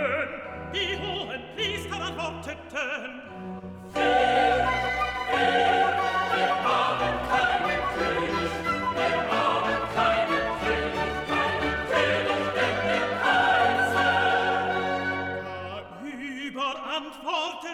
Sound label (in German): TENOR, Stimmen mit Klavier; Johannes Passion